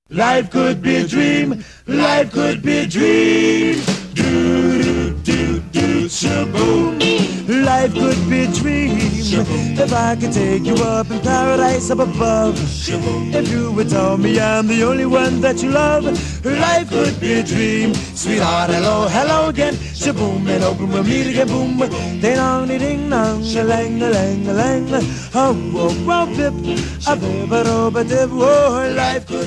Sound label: Chords